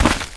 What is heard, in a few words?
steps; the monster plane; kolmods